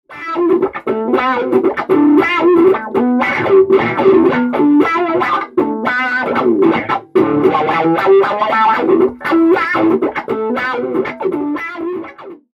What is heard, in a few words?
Amp DRIVE Wah